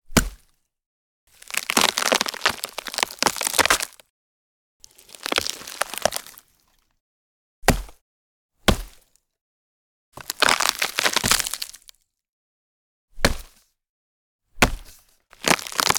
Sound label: Rotten Tree Hitting And Splitting With An Axe